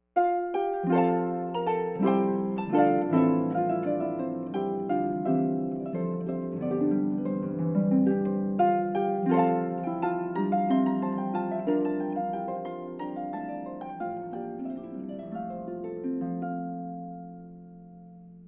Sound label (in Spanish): arpa